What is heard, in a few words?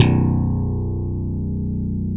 FUNK BS B1, SLAP BASS